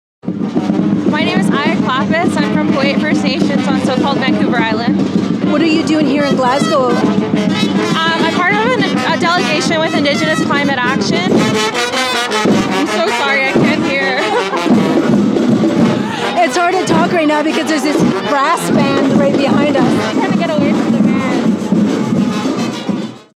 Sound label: brass band; Aya Clappis